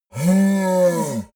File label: yeti help call painful; kreaturen